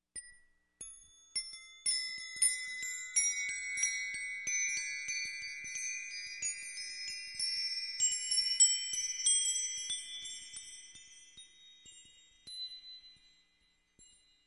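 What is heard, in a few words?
chime; Перезвон колокольчиков создаёт волшебные звуки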